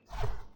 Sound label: dash; HLD